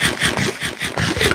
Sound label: eating, minecraft